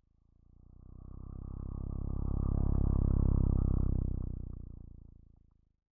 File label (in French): Grognement